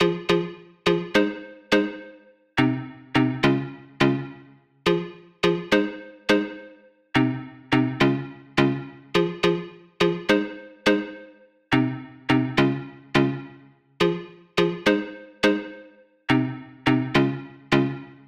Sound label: Pluck Pop Choppy Fm 105; Sample Banks; Melody; Keyboards